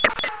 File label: timebomb; hl2